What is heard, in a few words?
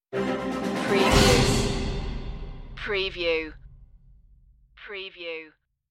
diabolical machines stinger